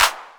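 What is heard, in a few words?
808-CL, 808 clap; E-Lab; X Static Goldmine 1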